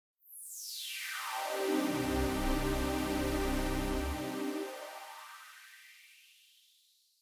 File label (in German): 24dB HP Waldorf Filter Resonanz